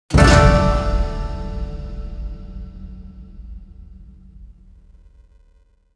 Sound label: Battle Royale Match Start; Naruto to Boruto: Shinobi Striker; PS4